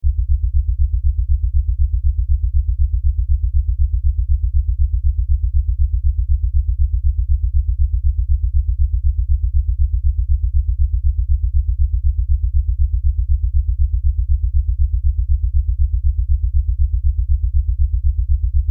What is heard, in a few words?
Lfe Pulse Low Bass; Drone; Sound Design